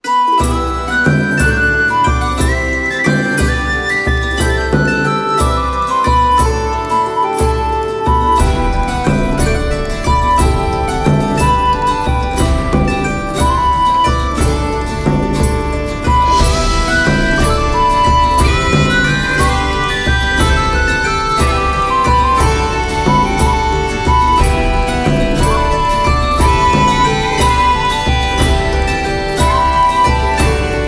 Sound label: medieval minstrel ballad